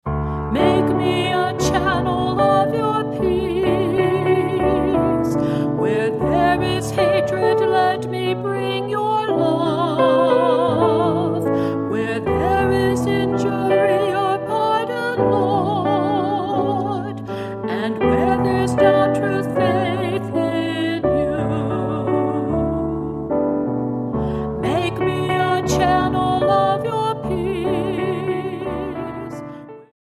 Prayer of St Francis, Prelude and/or Preparation of the Altar and Gifts Music; arr. Holstein